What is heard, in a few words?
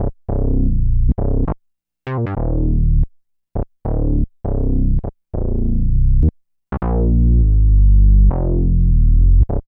BASS LP; East West - Phat & Phunky CD2; 90's Sample CDs